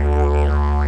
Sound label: Digerido; Best Service; Ethnic Journey Sound Cube